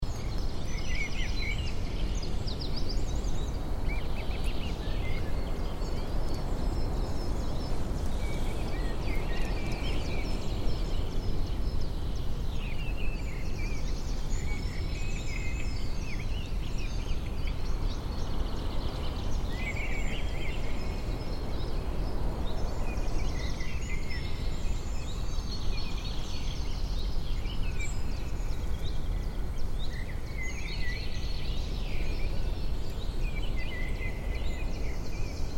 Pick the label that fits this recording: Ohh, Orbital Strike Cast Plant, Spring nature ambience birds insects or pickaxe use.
Spring nature ambience birds insects